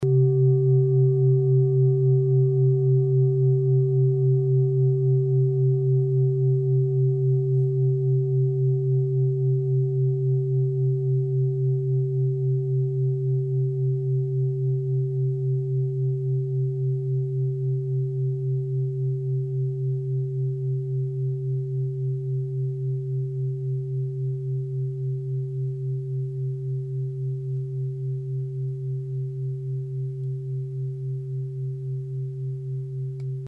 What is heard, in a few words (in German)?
klangschale ladakh; Klangschale Nr.15 Bengalen